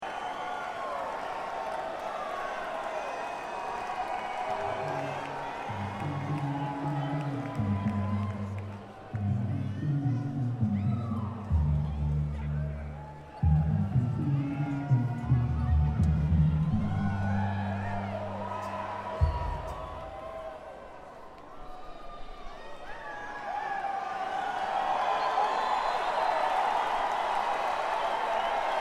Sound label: crowd